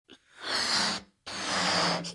inflating balloon

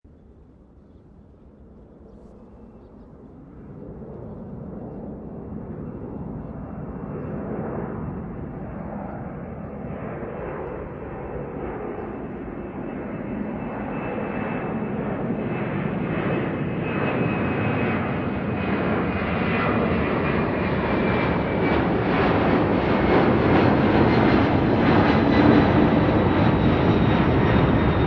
airliner ascend

